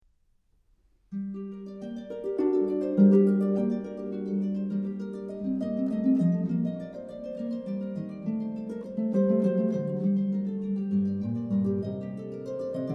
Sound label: Sonata for Violin and Keyboard; Allegro; J.S Bach; 9. Allegro